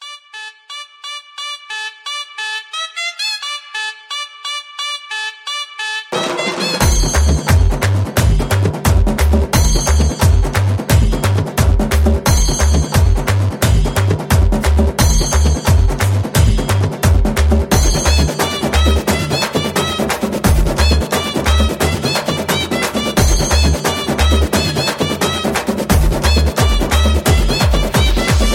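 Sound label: background Tone Telugu Ringtone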